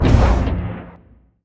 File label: torpedo; World Of Warships